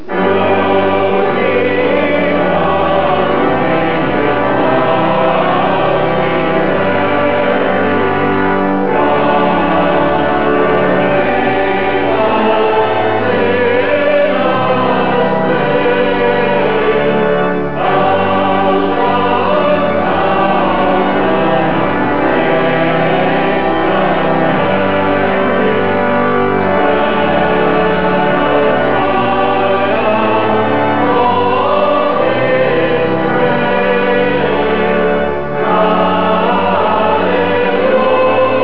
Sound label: choir